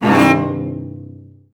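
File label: cellos C2; Best Service; Peter Siedlaczek's Advanced Orchestra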